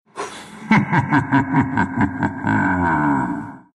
laugh ru; dota2

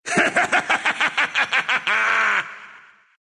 laugh, ru; Invo; Dota 2

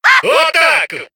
attack

